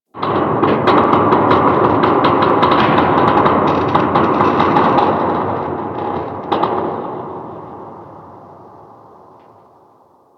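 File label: Ice Metal Distant; Ambience; Warframe; Corpus Ice Planet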